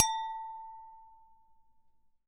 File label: Wineglas long, Convolution Household IR; NI Kontakt 5